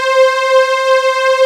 PAD WARM, Nord

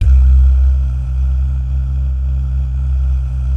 DAH, Vocal Planet, Jazz & FX, DAHS GUYS; ILIO

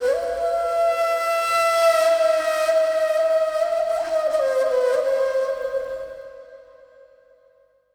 SIERRA FLU

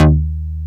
DASH D#2, BASSES

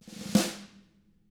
roll, snare; ILIO; Double Platinum Drums 1

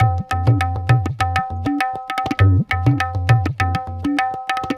Tabla; Sonic Foundry (Sony Creative Software); Ambient Grooves

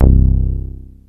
inst wave; Rubber Chicken Software Sample Bank

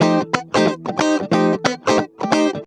ACID JAZZ

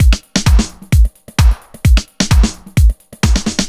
Drum Loops, 130 BPM; Underground UK House & Electro

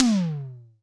FX SYN, Analog PER; Sound & Vision - Gigapack II

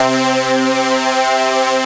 C Synth, One Shots

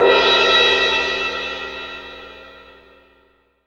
rock 18 crash, mallet cymbal

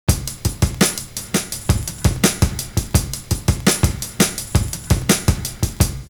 busta pulse, loop; Univers Sons

